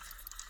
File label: fishing reeling